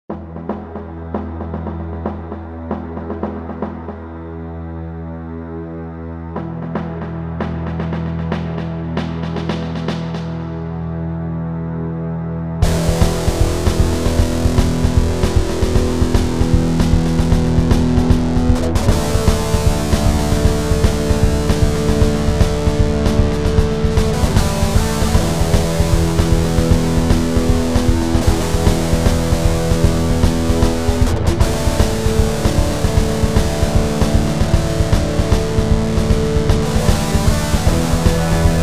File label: music upcoming action heavy; Ioncross Total War